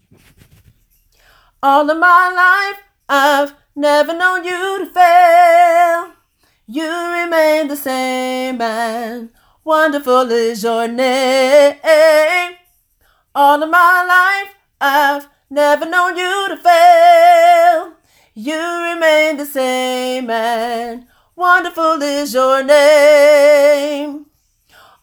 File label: Tenor